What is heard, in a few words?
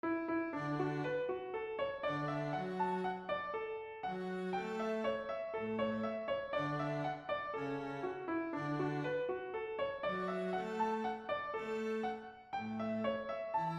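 reel, melody with viola and cello slow tempo; Reel des Vacances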